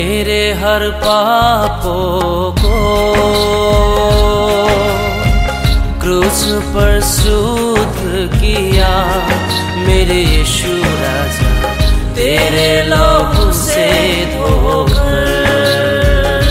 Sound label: christian music ringtone